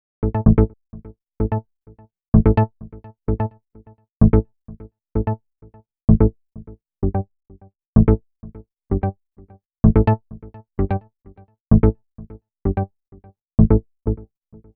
Bass, EDM

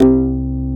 Korg CX3, C 1

